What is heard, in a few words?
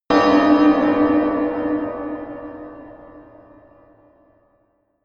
melodic disharmonious bell high